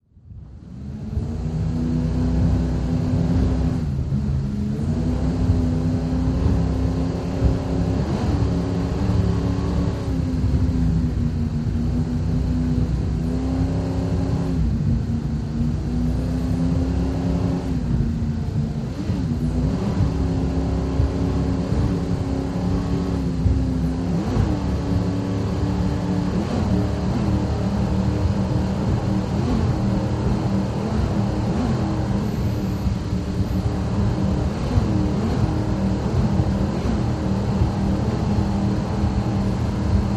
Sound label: Power Boat Int Racin, off shore power boat